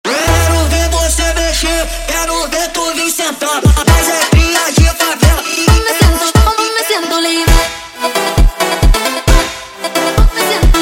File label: Funk sped Up